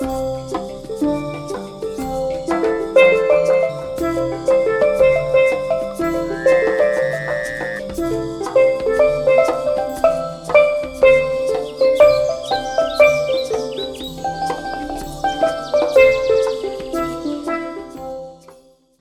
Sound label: steel drum